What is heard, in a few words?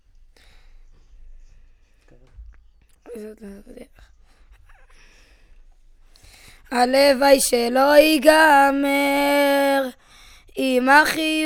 VOC